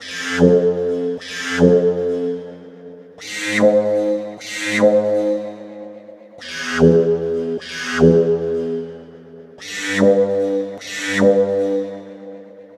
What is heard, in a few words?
Synth Pattern; Electrocution